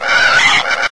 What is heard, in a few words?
pain, bullchicken; mistake coop